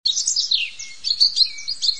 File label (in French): Ambiance oizo; bruitage